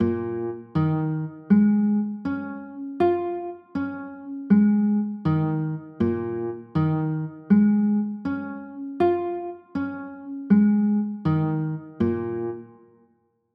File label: b flat m, chords; b flat m11